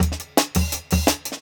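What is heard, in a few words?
Jungle Breaks, 170 bpm; Original Jungle Breaks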